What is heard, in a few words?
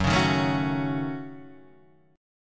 Gb Minor 6th Gb Guitar Drop D